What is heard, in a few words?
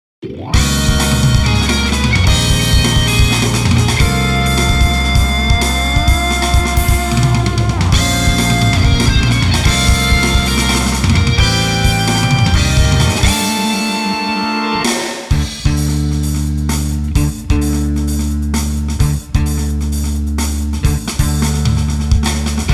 Minuet; Taiko no Tatsujin 2; Wii